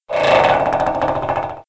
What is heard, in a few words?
Vent Stress; Playable Tutorial Level